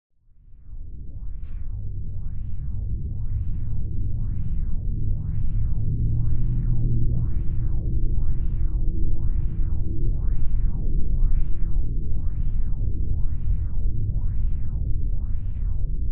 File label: ambient